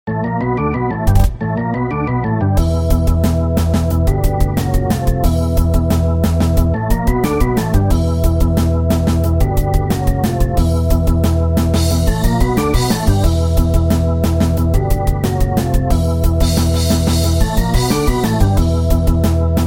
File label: Hammond B3 Std Low Slow Bright Fast High Slow Speed; Picked; Bassesland; Syntheway